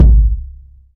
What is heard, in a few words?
rich lows bass drum one shot g sharp key